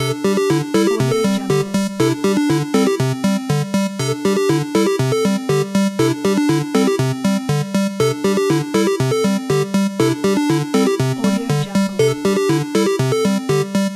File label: 8bit video game loop